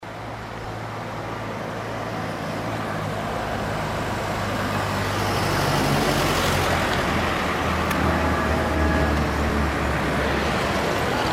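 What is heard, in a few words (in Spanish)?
Sonidos frente al Capitolio, La Habana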